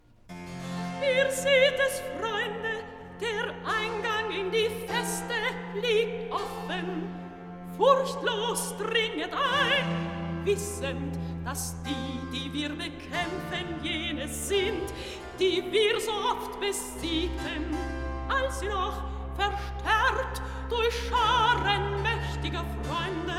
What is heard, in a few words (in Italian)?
Recitativo; Cyrus; Ihr seht es, Freunde; Handel Masterworks; Klassika